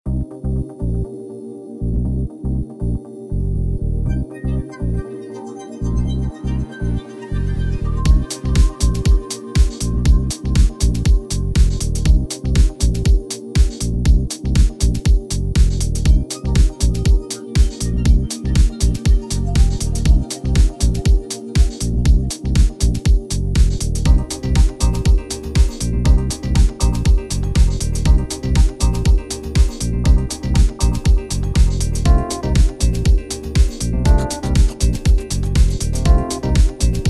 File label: Chilled Ambient